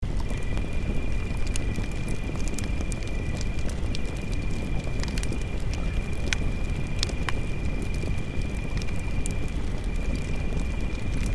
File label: Relaxing campfire night cozy ambience loop